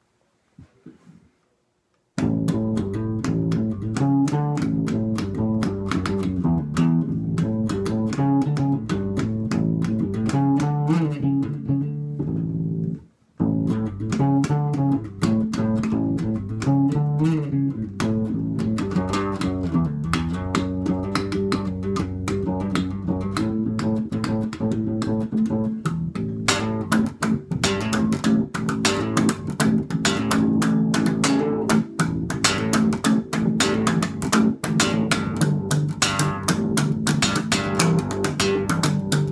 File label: Bass